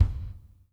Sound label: PIANO HIT, KICK DRUM; Sampleheads; New York City Drumworks VOL-1